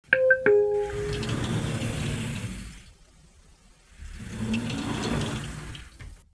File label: lift